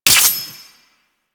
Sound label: Claws Extend A